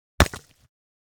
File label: Rock impact on dirt and gravel single hit